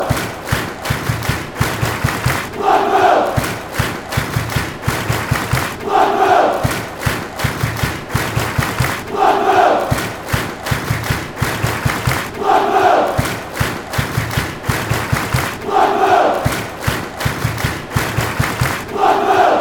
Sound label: clap; blackpool